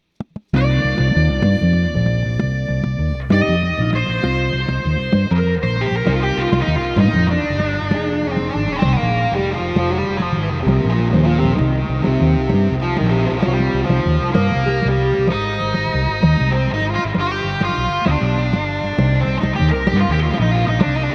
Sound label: Cm jazz fusion improv